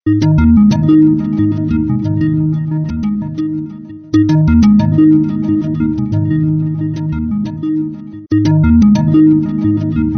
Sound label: Ringtone; Honor Pad 9